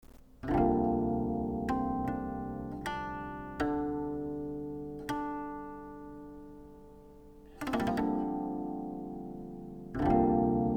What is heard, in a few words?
guqin prelude; ancient melodies forever praising